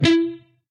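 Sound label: Distorted Single E 1st String Palm mute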